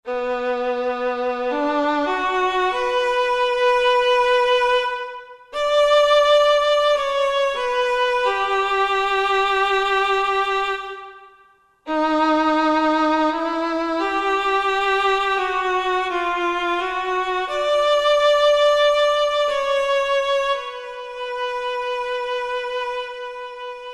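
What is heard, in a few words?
Sad Solo Violin, Roland SR-JV80 Orchestral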